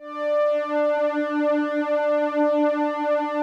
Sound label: OBERHEIMS